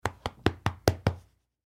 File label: Dog Pat Belly Pet, Dog Pat Belly Pet Fur; Animal; Dogs; Blastwave